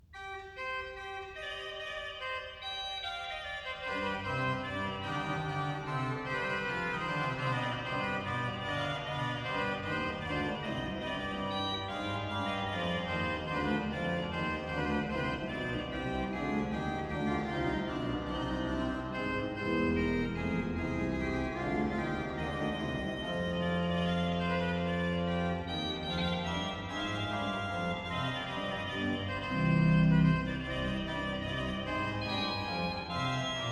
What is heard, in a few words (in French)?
Orgue, Duo; Nicolas de Grigny; Livre d Orgue, Hymnes Hymne IV No. 3